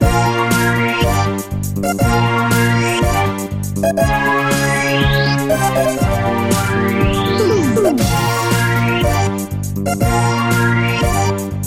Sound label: Disco; lego star wars